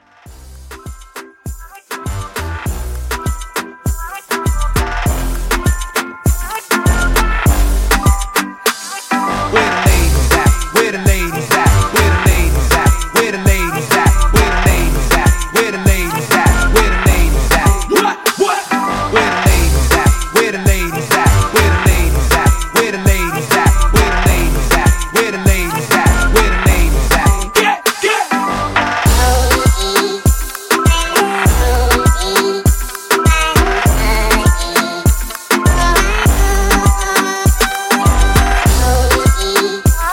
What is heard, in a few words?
Moombah Hype 100